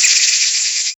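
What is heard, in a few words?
loud venomous forest spider, creature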